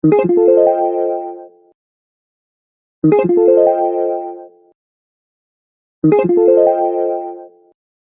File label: soft pulse ringtone; iphone 6s